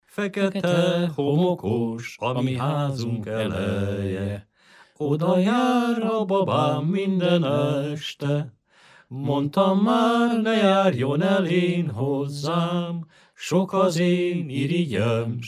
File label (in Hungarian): nepdal; fekete homokos